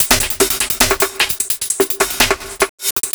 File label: Busy Beat, Drum N Bass; Sonic Foundry (Sony Creative Software); Knutrix Drum & Bass